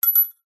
industrial metal washer drop or set down on concrete paver